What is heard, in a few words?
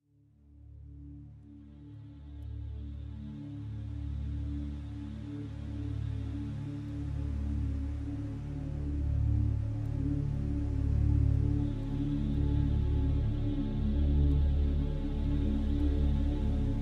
Music For Meditation - Healing Songs of Sea, Dolphins & Whales